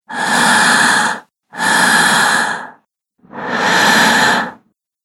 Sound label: Female Breath Inhale Collection; Recorded Voice